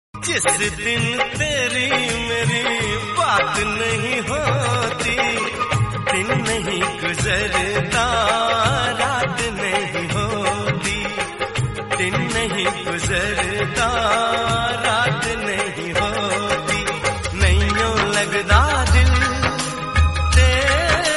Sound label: hindi old song; muskaan